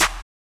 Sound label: Open Snares